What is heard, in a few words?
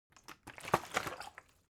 object bottle water sparse upend slosh; smartsoundfx